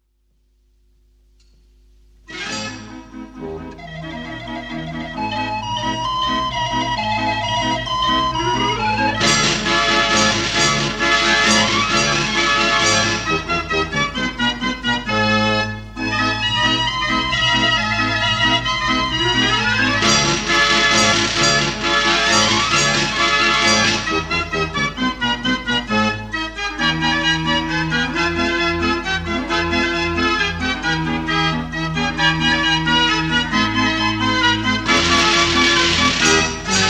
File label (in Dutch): draaiorgel; de groote buik